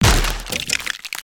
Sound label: Wood Splinter; destructibles